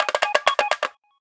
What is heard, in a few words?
Rattle Drums; Pantech Discover-P9090